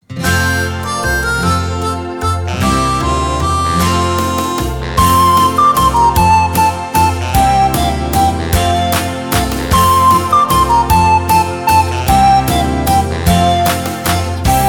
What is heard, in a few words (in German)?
Suse liebe Suse Listening, recorder